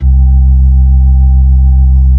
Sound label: B-3, Blues